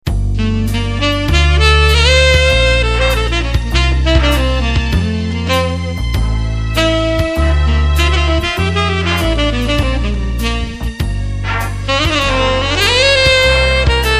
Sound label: Jazz Piano